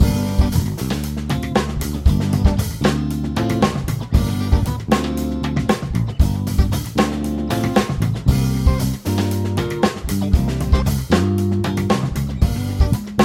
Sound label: solo loop